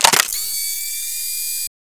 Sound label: powerup pickup; Garry's Mod; tf2